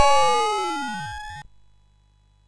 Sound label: Final Synth Sample, MOS6581R3